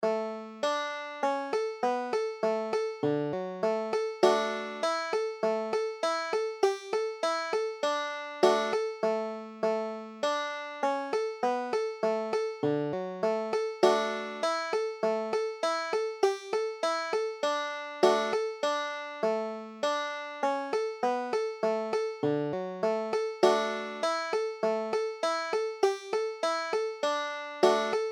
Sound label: old french, banjo